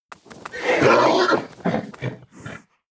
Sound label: attack; Rust